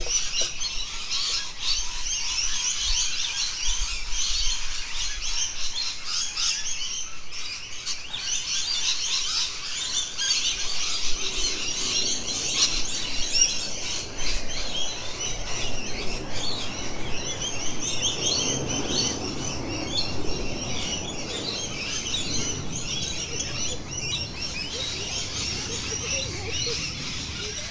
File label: Rainbow Lorikeet call